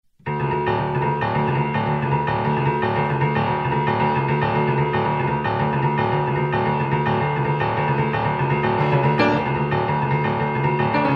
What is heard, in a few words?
Rumble Boogie